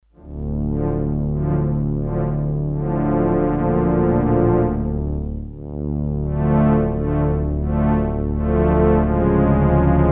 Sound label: Low Strings, Korg DS-8; Factory Patches